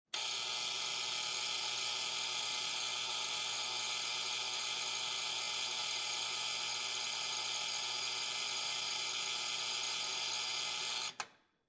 Oven Timer Alarm, Household, Oven Timer Alarm On, Run, Turn Off; Kitchen